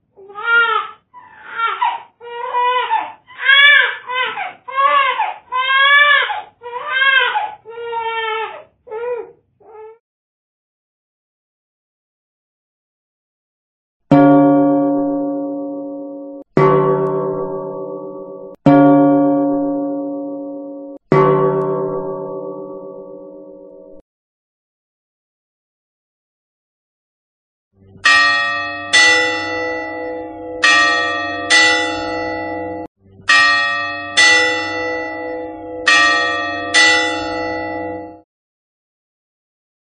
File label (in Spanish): Agudo grave 8 sonidos